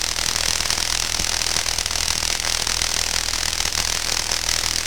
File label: electrical arc; skibidi toilet glitch